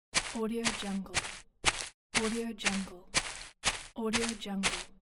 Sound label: footsteps walking on grass